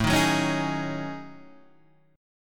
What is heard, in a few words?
Gb Minor 6th Add 9th A